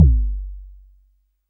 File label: Perc, Clavia Micro-Modular